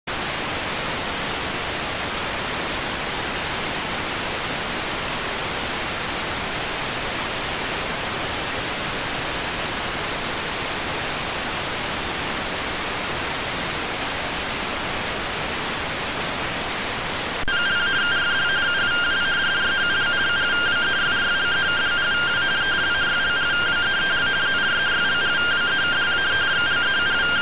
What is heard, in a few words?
rtty rx